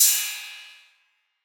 crash single hit f key, Royality free crash cymbal one shot tuned to the F note.